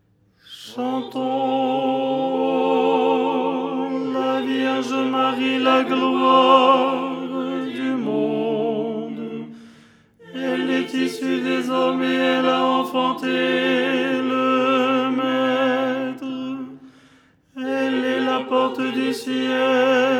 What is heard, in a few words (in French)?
tenor